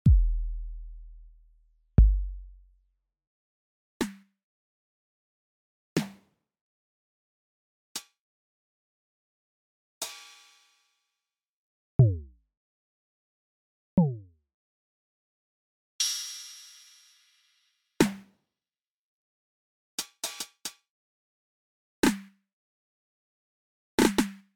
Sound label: default sounds, heartbeat; Drum Synthesizer; Softube Heartbeat; Drum Synthesizer aus den 1970er und 1980er